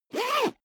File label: zipper backpack close fast